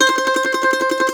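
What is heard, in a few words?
MANDOLIN TREMOLO, Plucked Strings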